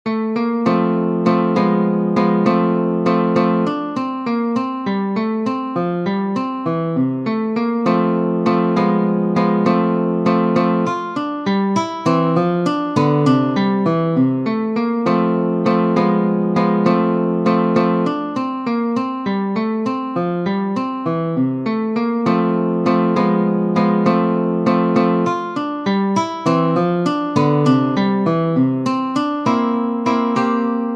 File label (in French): Andante, guitare; Ferdinando Carulli; Op. 241 Méthode pour la guitare